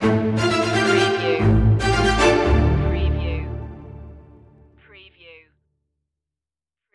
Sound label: strings of passion stinger